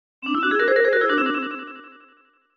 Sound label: notification